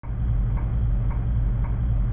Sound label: Spaceship; SFX; Ambient - General